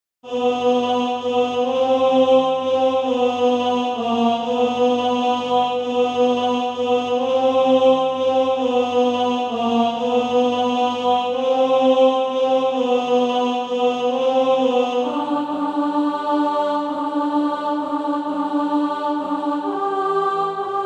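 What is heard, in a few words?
alto, Sheet music (SATB) including Practise Music; Day Is Dying in the West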